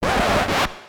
record scratch; soundboard

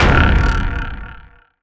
pick spin, ui menu

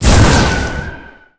attack; dota; lich

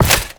metalarmour drop; ui; inventory

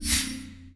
topmenu select; ui; dota

